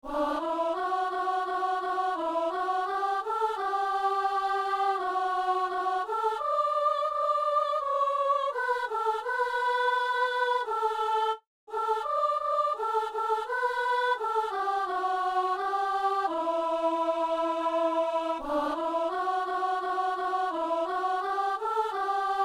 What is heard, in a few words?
soprano; Messe du 17 janvier à Saint-Denis